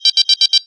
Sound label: proxy mine; weapons